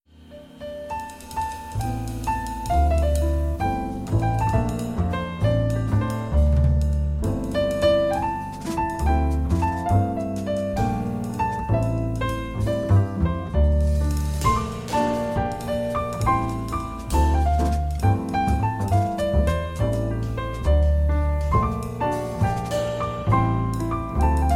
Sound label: Irish Folk Song